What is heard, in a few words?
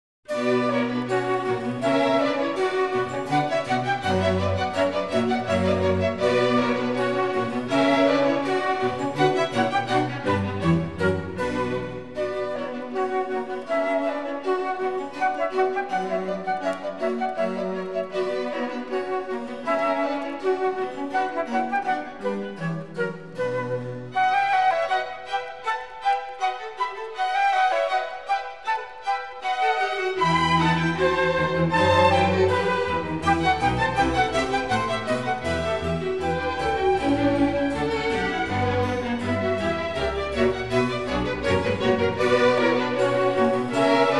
Orchestral Suite In B